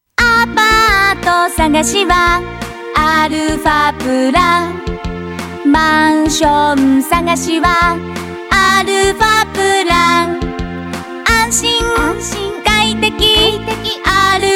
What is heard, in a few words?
song